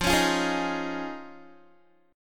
F Minor 7th Sharp 5th, Guitar; strummed

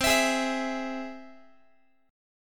C Augmented down Guitar; strummed; x,20,19,18,20,x

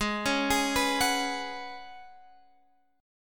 C sharp 7th Suspended 4th G sharp

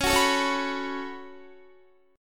Db 7th Suspended 4th Sharp 5th Db down Guitar; strummed

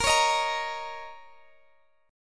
Bb Minor Double Flat 5th Bb, Guitar, Open D; x,x,20,19,18,20

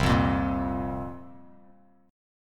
C 7th Suspended 4th, Bass Standard; C7sus4 strummed

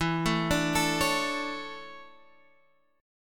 D Major 7th Suspended 2nd E

